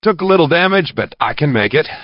wound

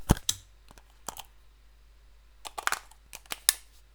battery reload; items

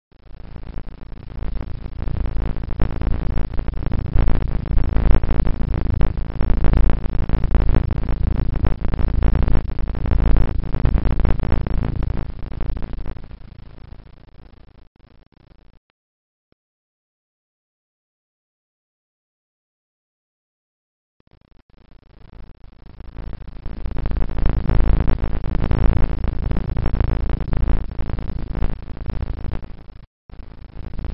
interference

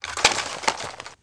gun pickup; items

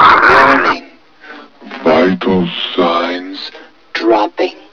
zombie pain; sven; zombie hev

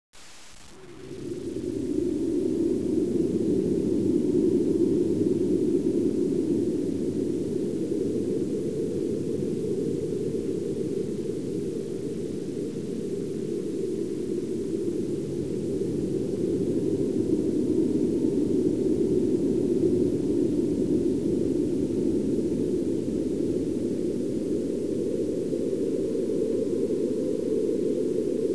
forest; sven; aomdc

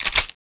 m4a1 bolt pull; weapons

